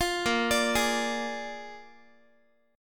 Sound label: A sharp 7th A sharp, Guitar; A#7; arpeggiated; 20,18,17,18,x,x